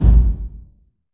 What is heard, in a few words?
LARGE ROOM 808, REVRB